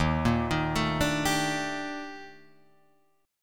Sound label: Eb Major Sharp 11th Eb; EbM#11; arpeggiated; x,x,1,3,3,4,4,4; JGuitar